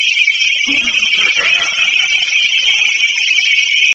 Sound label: unknown creepy laugh; St Joseph Cemetery; Spirit Chasers of the Unknown; EVPS